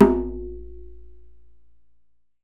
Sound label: Djembe